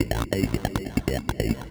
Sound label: TECHNO SYNTH 140; Keys